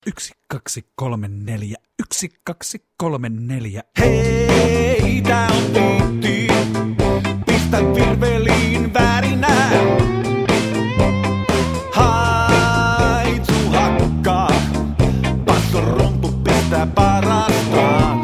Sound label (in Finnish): alkulasku beat rummuilla